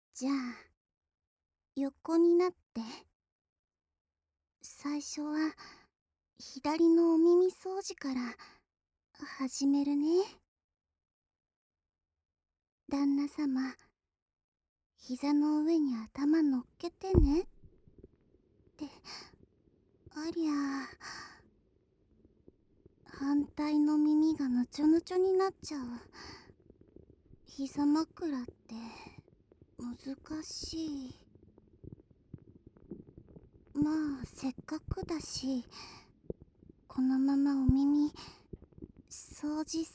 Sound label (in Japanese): 耳舐め 時 スライム なし; お耳…気持ちいい…？